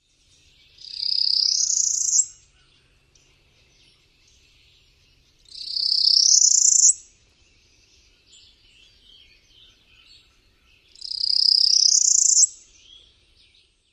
song variant; KY

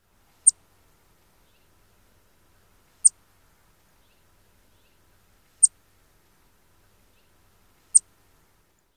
tsip calls; AZ

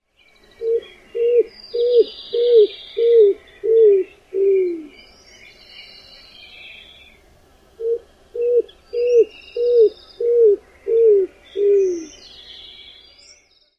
song; AZ

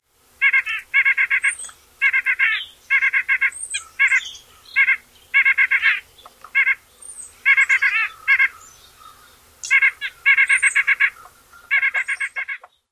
yank calls

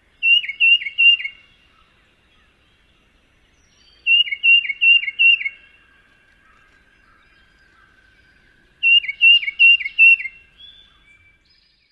songs

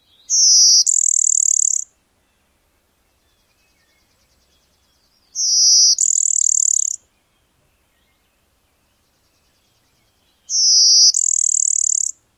normal song; NY

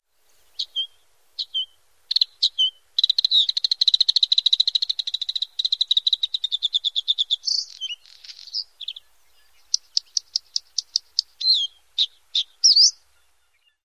song

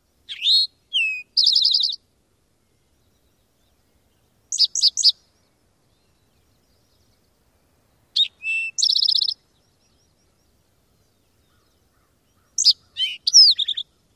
four song types of one male

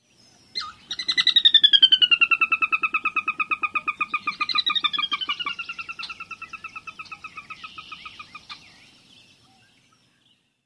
Sora whinny calls

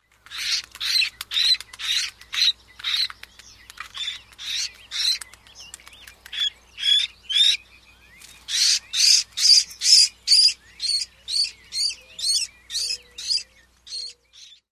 distraction display near nest

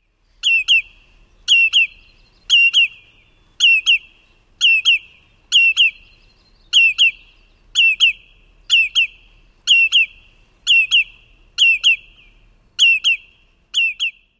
too-too calls; AK

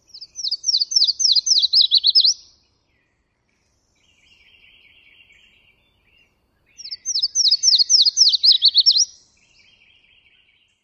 songs

